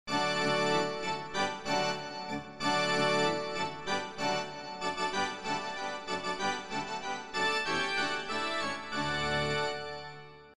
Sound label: Piano; Piano app by Yokee